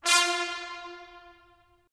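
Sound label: brass trumpets staccato; Roland - LA Composer vol. 4; 90 s Sample CDs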